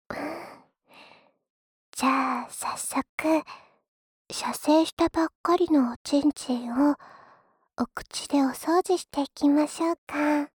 又吸又舔又榨♪

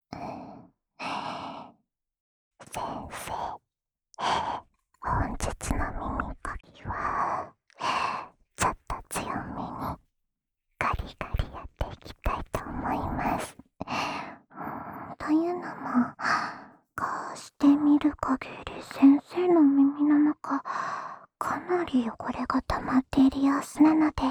安眠用 咯吱咯吱的掏耳循环 左耳

